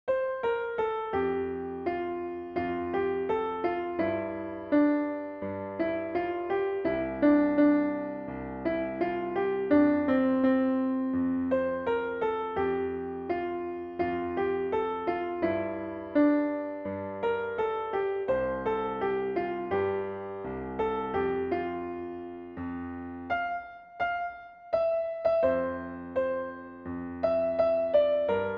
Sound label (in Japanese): ピアノ